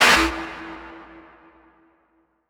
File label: Transition Hit Impact Short; Adobe; Production Element